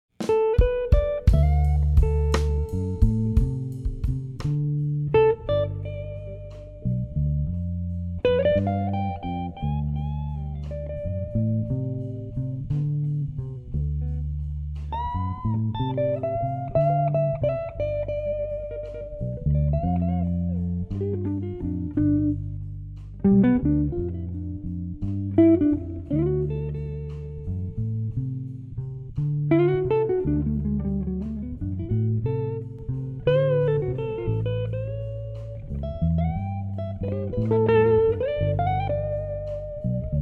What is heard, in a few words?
Slow Blues Side Stick